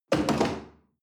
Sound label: chair wood place hard